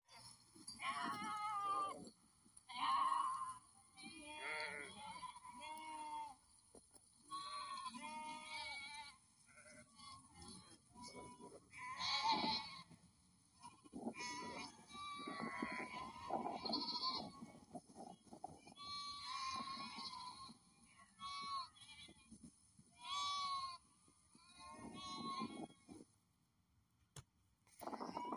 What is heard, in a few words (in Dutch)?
Rhedense Schaapskudde luister naar buiten